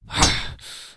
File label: daemon attack; propack